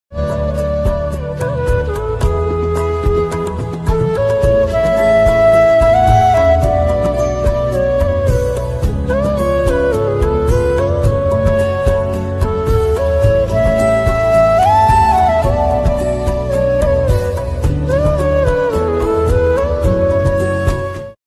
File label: background music ringtone